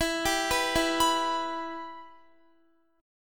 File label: E-Minor, Guitar; arpeggiated; x,19,17,16,16,18